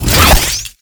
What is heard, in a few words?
Mine Launch; Tau; Twelve Minute War